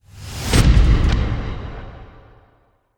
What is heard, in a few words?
Pile Driver Heart Attack; horror and atmosphere fx; Silent Hill Downpour